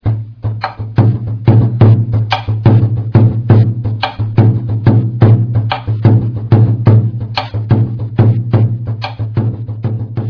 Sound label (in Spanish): pandero